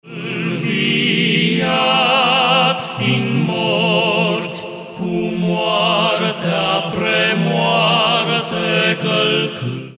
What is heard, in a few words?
Voice Quartet; Hristos a Inviat; florilegiu